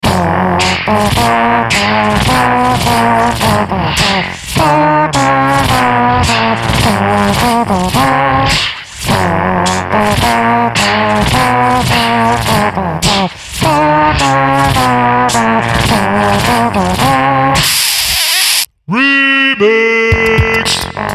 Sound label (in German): BEATBOX; 4XSample